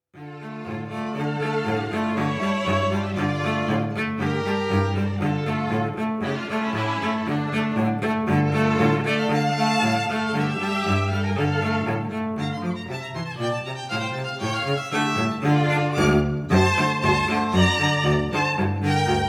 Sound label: trio hungarian dance